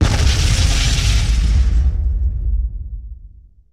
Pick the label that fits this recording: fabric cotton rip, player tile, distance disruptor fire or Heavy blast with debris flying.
Heavy blast with debris flying